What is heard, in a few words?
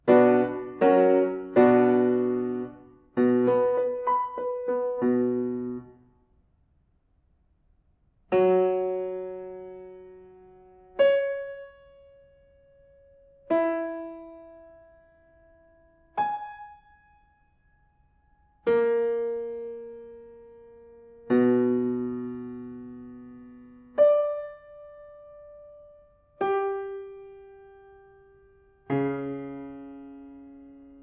diatonic collections